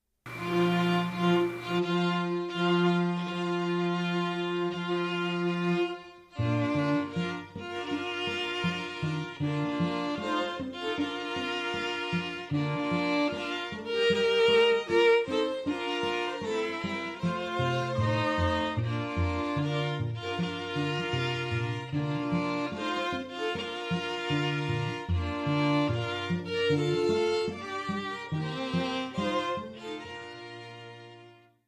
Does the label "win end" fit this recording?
No